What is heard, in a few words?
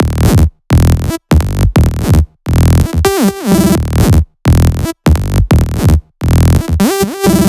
128BPM Melody; Vengeance Samples; Wasa Kit